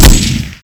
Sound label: Fire, mp7